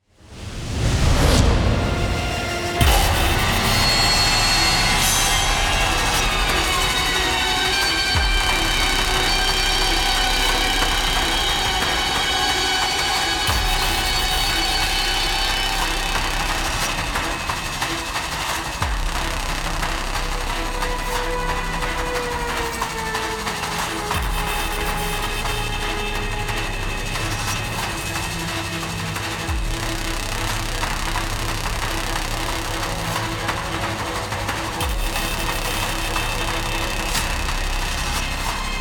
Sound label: Swirl, Tension Action